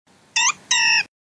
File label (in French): Cri pandespiegle